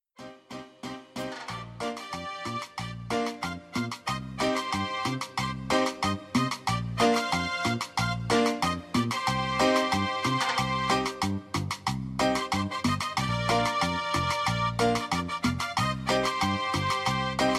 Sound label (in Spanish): Cumbia